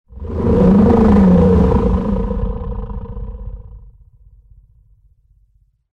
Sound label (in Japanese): モンスターの鳴き声